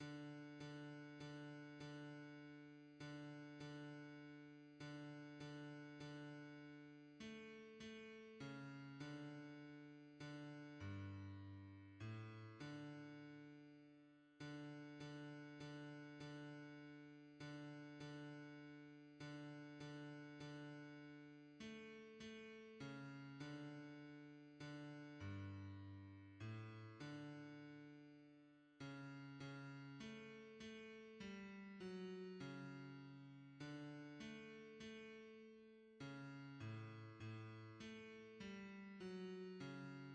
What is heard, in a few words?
bass; Seigneur Jesus ton amour reste; hymns